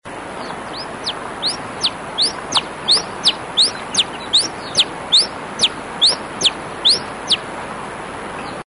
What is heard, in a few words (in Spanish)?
voz cachilo